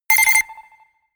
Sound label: notification pager